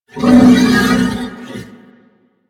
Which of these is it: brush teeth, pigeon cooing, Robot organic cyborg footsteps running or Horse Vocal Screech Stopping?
Horse Vocal Screech Stopping